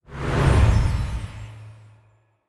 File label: Magic Whoosh